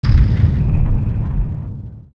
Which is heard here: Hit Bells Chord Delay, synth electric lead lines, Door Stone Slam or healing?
Door Stone Slam